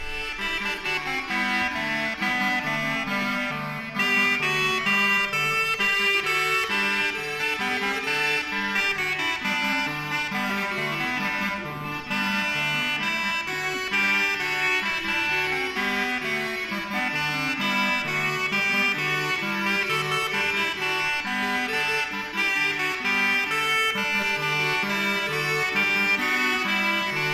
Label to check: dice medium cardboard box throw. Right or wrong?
Wrong